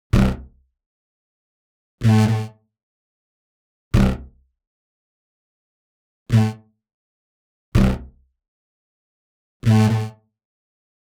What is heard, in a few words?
126 B#m Bass, Bpm126, Complex Electro Synths; Prime Loops; Biomass